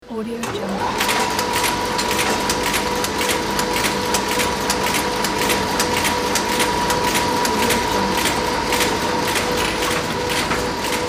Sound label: conveyor belt